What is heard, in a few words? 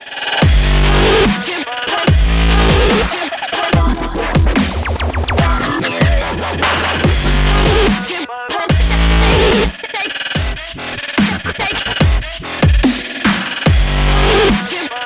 Dubstep; Bensound